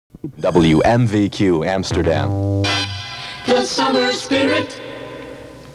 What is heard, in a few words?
jingle; wmvq; the summer spirit